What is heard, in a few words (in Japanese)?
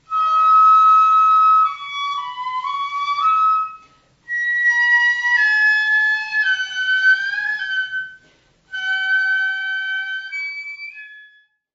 横笛